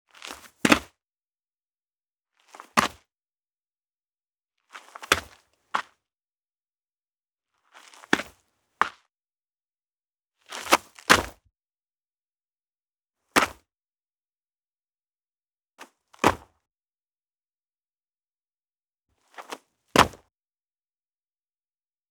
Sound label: Jump; Minora-Game; Sound-Artlist